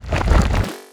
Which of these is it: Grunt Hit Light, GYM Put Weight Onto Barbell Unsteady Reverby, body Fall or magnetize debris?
magnetize debris